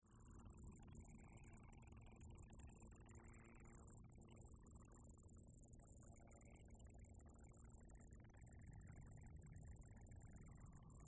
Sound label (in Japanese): kubikirigisu